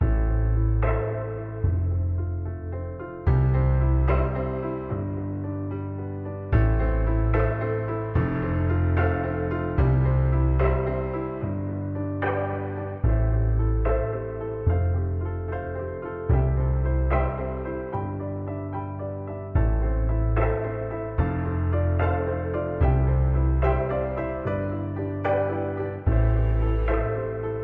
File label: piano symphony